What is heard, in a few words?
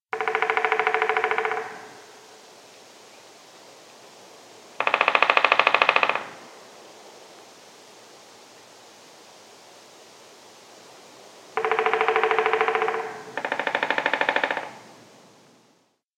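trehpalyy dyatel golos, three-toed woodpecker voice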